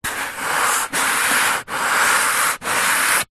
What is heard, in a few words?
cardboard thin strip rub slow table